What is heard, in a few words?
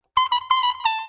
Trumpet part 4 last 4 beats